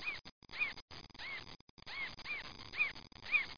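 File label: mockingbird; 10,000 Graphics Pack Volume 1; Media Graphics International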